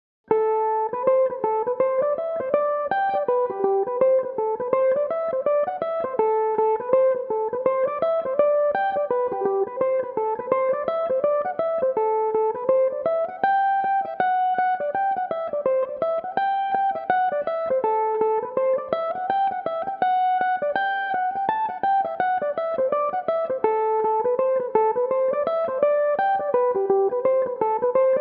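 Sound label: A dorian, irish mandolin, tunes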